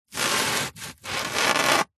foley polystyrene sheet flat thin scrape creak

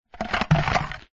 horror creature monster bite each chunk crunch

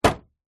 car door close volvo 850

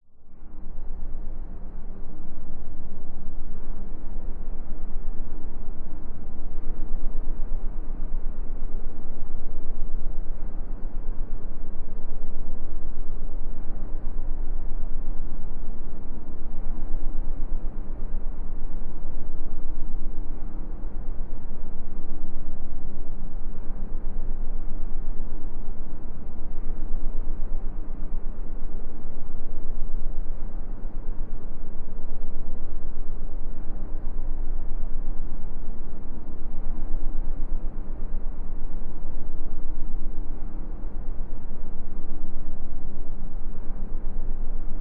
sound design drone subtle airy wide

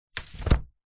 foley umbrella open; zapsplat

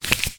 leisure toy money note movement; zapsplat

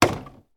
small drink water bottle fall over on table

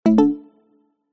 notification digital

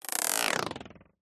cartoon stretch pull expand

